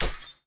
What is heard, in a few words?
Drop Key